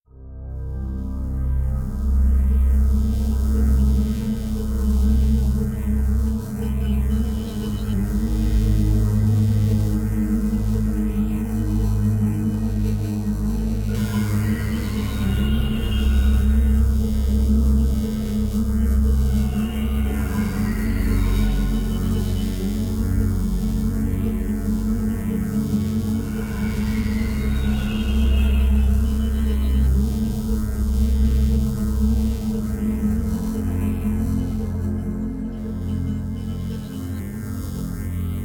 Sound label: ambiance